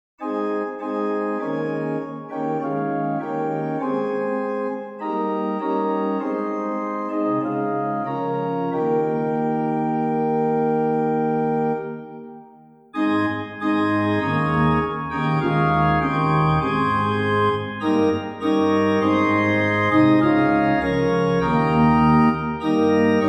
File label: Organ